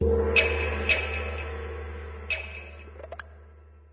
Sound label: tibet style